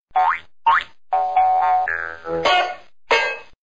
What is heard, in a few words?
Cartoon Ting